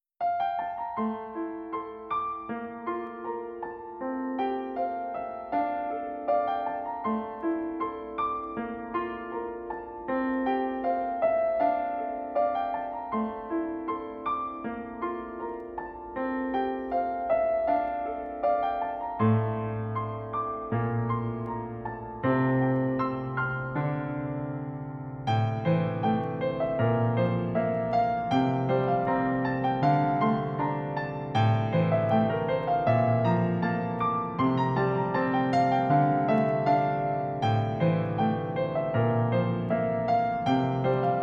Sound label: sad japan piano flower spring